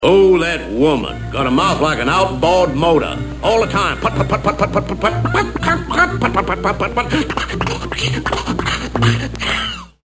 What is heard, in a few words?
woman mouth